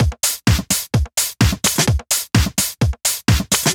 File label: House Beat, 128 BPM